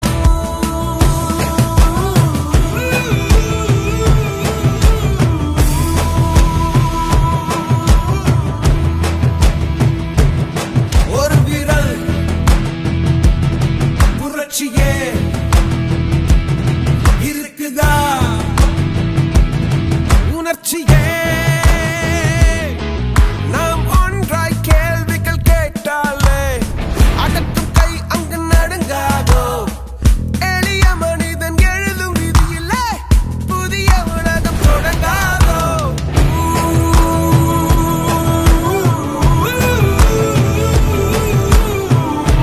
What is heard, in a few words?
Drums Beat